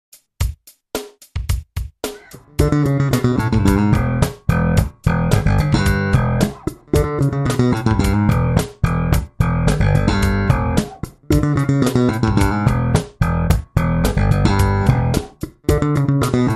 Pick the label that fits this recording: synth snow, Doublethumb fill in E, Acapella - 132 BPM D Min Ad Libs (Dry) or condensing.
Doublethumb fill in E